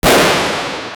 shooting from ak47; gunfire

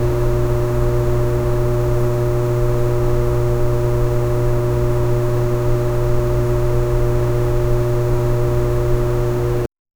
low continuous hum in an, electrical

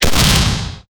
fire from burst pistol

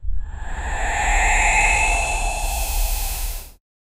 shadow dark impact

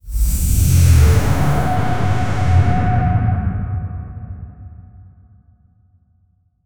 cinematic fx downer sound

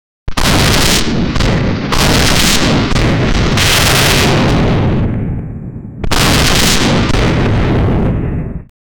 firework sound effects; holiday

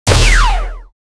shooter

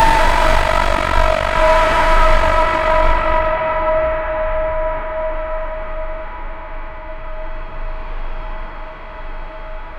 reverb noise metal cinema

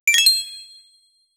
retro bright digital coin; interface

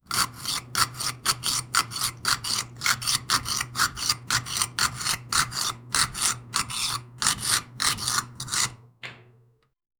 slicing and cutting asmr

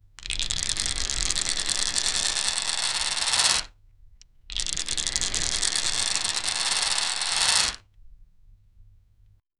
roulette rolling

